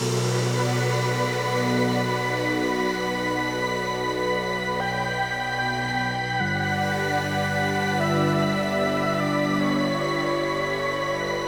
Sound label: Dialogue Music; Aeon Must Die; Ivory